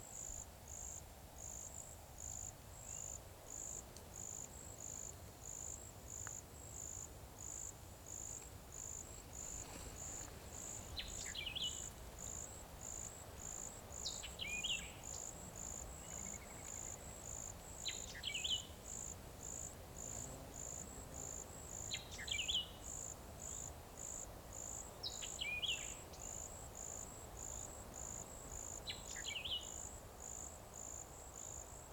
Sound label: Bird Calls Forest